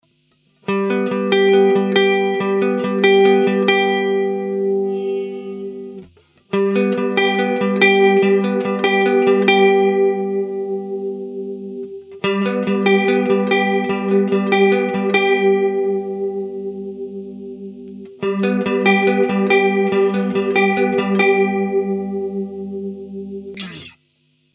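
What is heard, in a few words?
Tri chorus, Line 6 M13 guitar processor; череди включал каждый из трёх хорусов (сразу процентов на 70-80%). Имеем 1 регулятор скорости на 3 хоруса, соответсвенно 3 регулировки глубины и микс.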